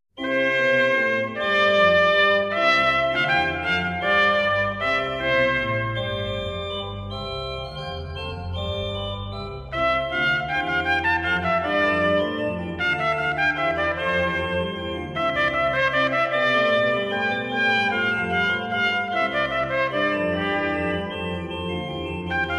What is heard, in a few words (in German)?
trompete u orgel; Giovanni Bonaventura Viviani; Sonate 1